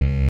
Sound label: error